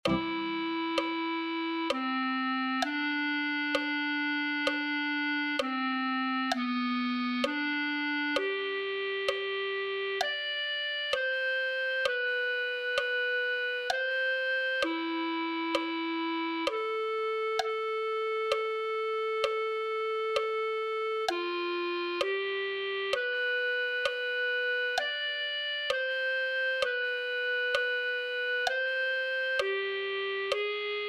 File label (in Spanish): clarinete